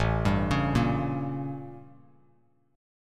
A 7th Flat 9th A, Bass Standard; A7b9 arpeggiated; JGuitar

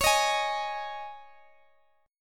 Db Suspended 2nd Guitar Open G

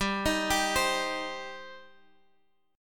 G Suspended 4th Sharp 5th, Guitar, Half Step Down

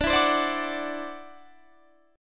E 7th Suspended 2nd Sharp 5th D, chord

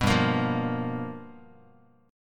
G 7th Sharp 9th G chord, Guitar; G7#9 strummed; JGuitar

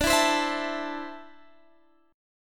D 7th Suspended 2nd down Guitar Standard; D7sus2 strummed

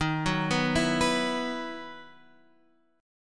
E Major 7th Suspended 2nd

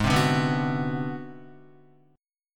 G sharp 7th Sharp 9th Flat 5th, Guitar; strummed; chord; JGuitar

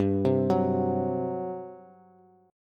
G Major 7th Suspended 4th, G, down, Bass; GM7sus4 arpeggiated

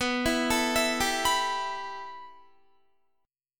B 7th Suspended 4th Sharp 5th, Guitar; B7sus4#5; arpeggiated; JGuitar

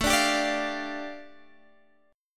A 7th Suspended 4th Sharp 5th A, Guitar Drop D; chord

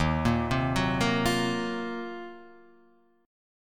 Eb Minor 6th Add 9th, Ebm6add9 chord; arpeggiated

